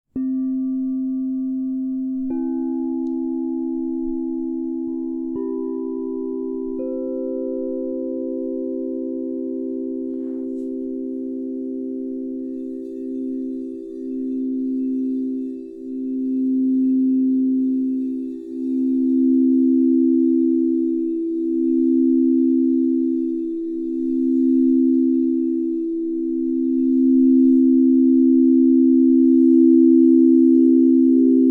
set, B E G Crystal Tones Harmonic Singing Bowl Set